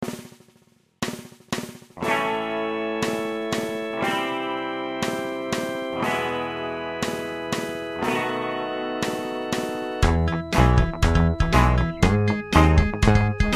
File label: rolls